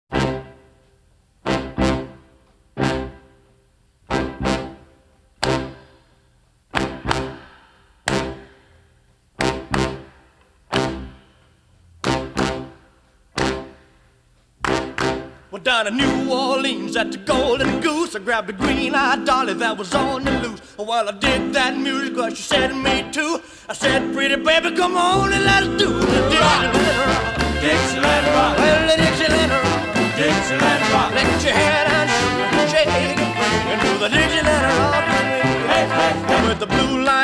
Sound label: dixieland rock